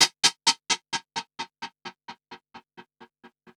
Gated Cymbal; creative gate; One Shots; musicradar

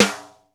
BEAT KITS; Crypton - DrumBase5000

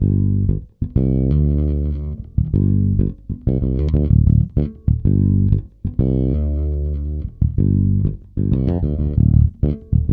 G, funk, bass; Spectrasonics; Bass Legends

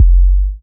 THUNDER DRUM

